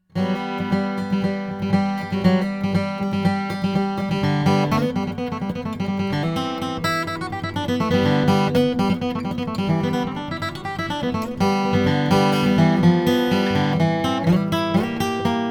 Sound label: martin d 45 indian rosewood